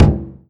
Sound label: taiko hit normal; Crimson Stella